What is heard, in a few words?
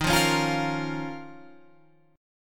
Eb 7th Suspended 2nd Eb down Guitar Open F; Eb7sus2 strummed; JGuitar